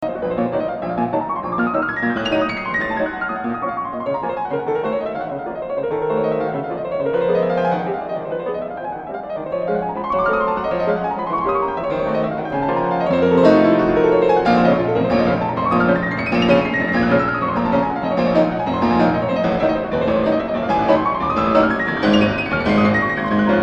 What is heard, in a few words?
Prelude in B Minor op.28 no. 16